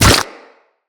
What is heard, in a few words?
Bullet Flesh; Impacts